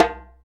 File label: PER Percussion